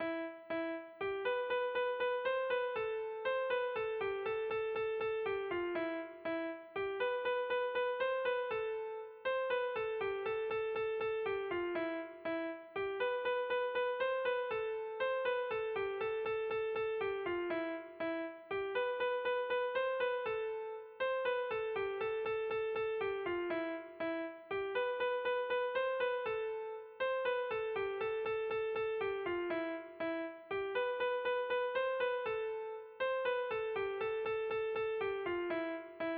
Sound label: melody